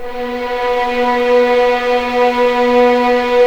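B3 legato violin, left, The Ultimate String Collection; East West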